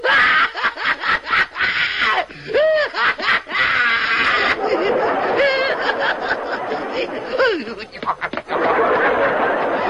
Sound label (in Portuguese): risada; quico; chaves